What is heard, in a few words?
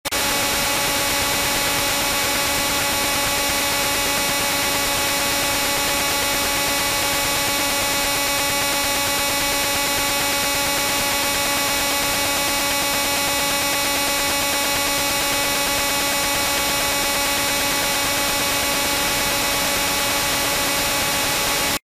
Meteor LRPT